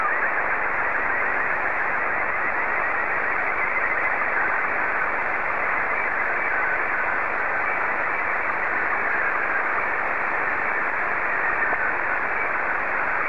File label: sstv; VK4MSL SSTV RX @ QG62ln